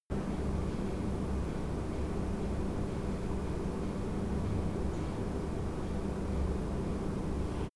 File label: buzz metal click small